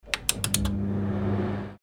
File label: microwave